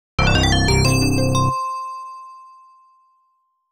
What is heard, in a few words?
Wave Complete; Off the Wall